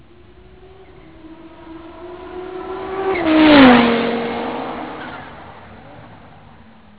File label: vroum